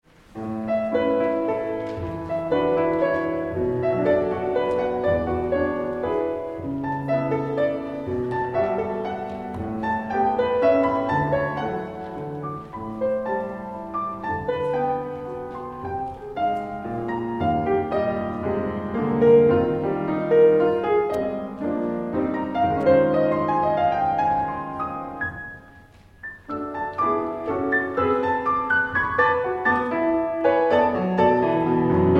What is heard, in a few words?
waltz